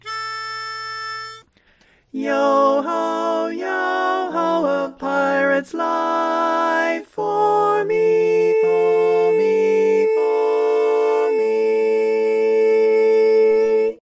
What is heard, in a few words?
female lead